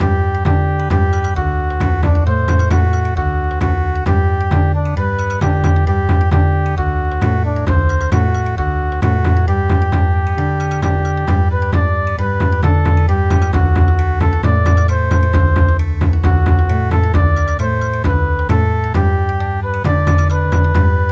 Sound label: Bon Odori, midi